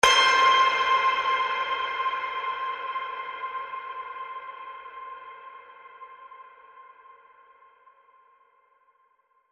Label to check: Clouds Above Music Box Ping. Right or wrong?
Right